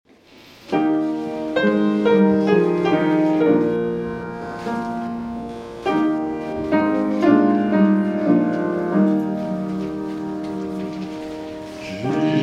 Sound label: Hymn; Saviour of My Soul